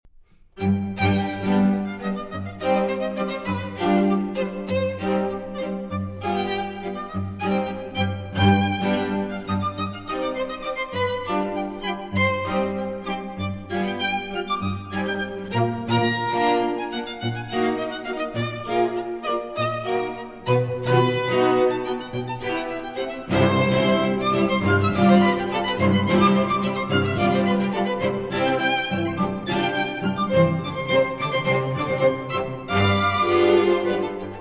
String Quintet in C Major, Allegretto - Più Allegro